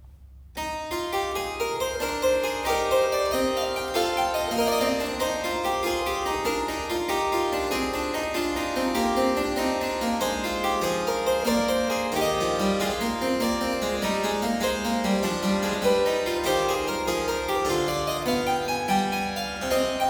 Dreistimmige Inventionen in E major, Keyboard Works; J.S. Bach; BWV 792; Johann Sebastian Bach; Inventionen & Sinfonias